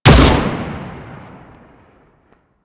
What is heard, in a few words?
Arch Dual Pistol Fire, Weapons; Warframe; Archwing